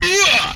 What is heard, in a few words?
hit, vocal; MMRetroArcadeSoundsPack